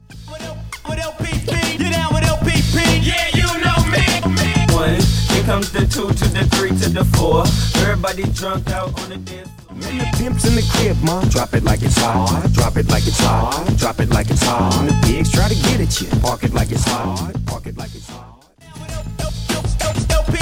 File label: Old School Hiphop Megamix 98 107 Mashup